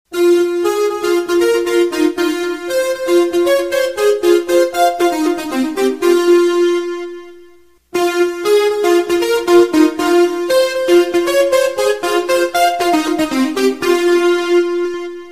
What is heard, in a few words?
Sweet Flute